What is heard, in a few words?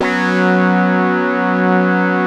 AQUA TARK, Synth; InVision Interactive; Keith Emerson; The Most Dangerous Synth and Organ; SYNTH LAYER